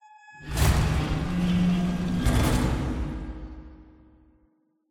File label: door and light; Poppy Playtime; Molding Room